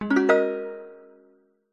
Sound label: multimedia game sound plucked accoustic and mallet generic tone